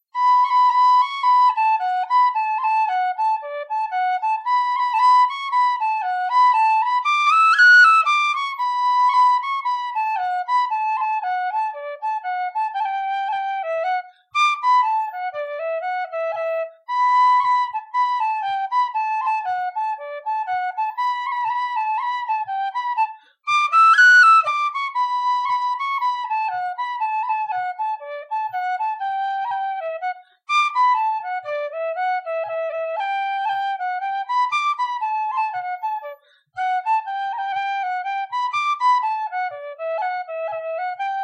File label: whistle; McMahon